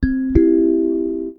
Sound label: notification in c major; recorded on my Meinl KL801S kalimba